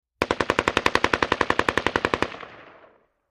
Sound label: Ak47 Machine Gun Burs